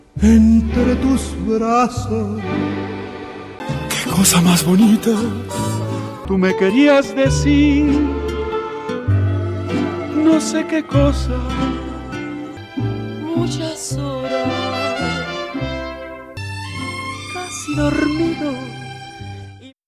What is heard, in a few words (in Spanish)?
Susurro